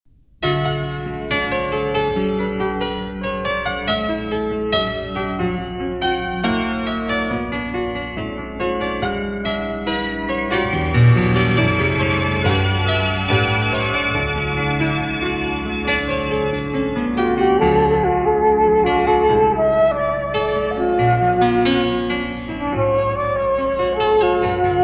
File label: Ending Music